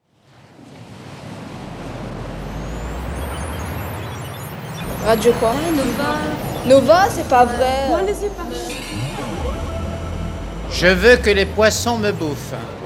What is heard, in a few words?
Radio Nova Jingle; Nova 25 ans - 1991